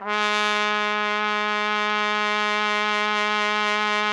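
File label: trumpet; Roland - LA Composer vol. 3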